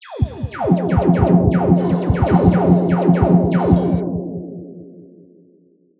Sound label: drummix